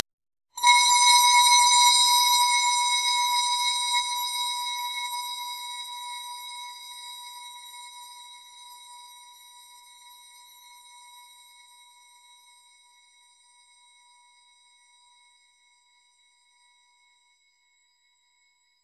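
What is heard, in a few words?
metallic shimmering bright, sparkle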